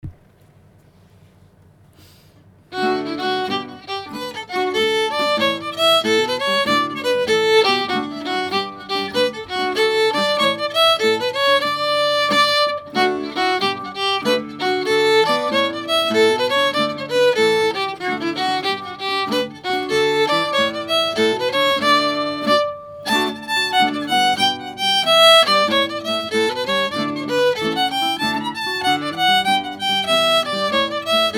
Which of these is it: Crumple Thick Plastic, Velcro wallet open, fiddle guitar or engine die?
fiddle guitar